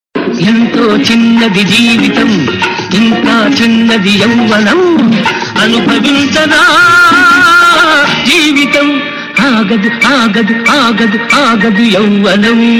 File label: huawei guitar